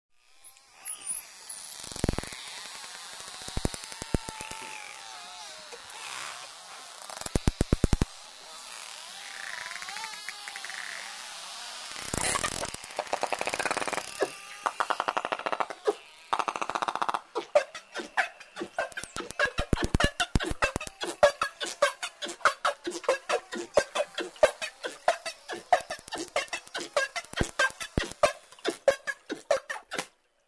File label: bottlenose dolphin; recorded by dr oliver boisseau